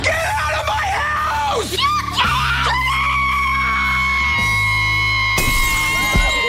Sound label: screams at girl